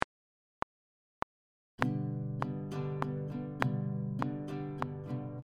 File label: Easy strumming pattern, guitar, 3/4 time signature